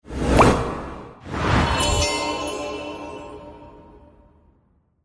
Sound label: Gacha Reveal Sound; Stabfish